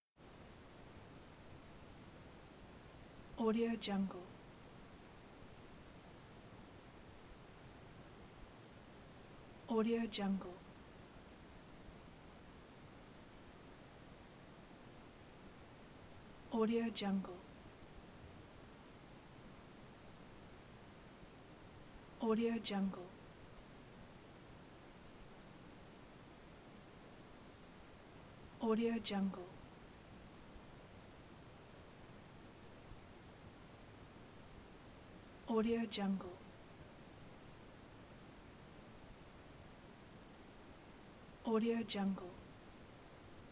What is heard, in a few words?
room ambience